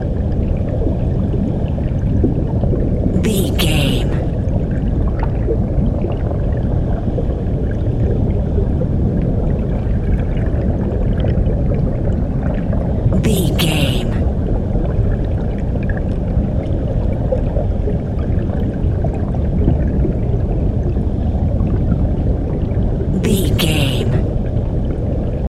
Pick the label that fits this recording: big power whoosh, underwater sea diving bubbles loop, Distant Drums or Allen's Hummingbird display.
underwater sea diving bubbles loop